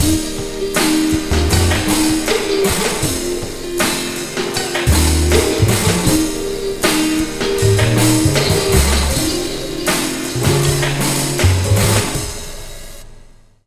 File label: Slo Mo Full Wak-C, Slo Mo Funk Mixes, Lounge; Zero-G Kocktail Kollection; Construction Kitz (Lounge)